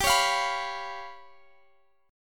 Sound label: G 7th Flat 5th G; down